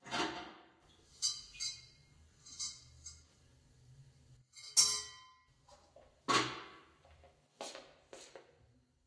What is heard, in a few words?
kitchen, bar ambient